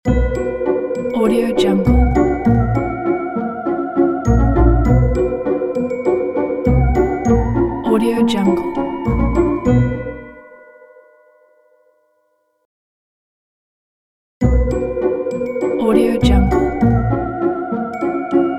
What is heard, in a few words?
halloween ident; cap-studio